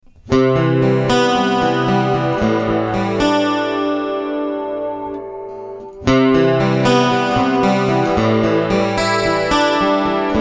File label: Preset Corona Chorus